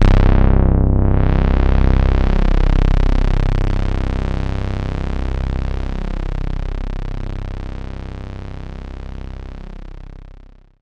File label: C1, Synth Line; 90 s Sample CDs; NiceBeats; SynthLine VOL-31